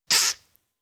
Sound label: Four Week Old Kitten, Individual; Cats; BBC Sound Effects Library